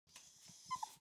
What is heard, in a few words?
animal dog whimper really sad; smartsoundfx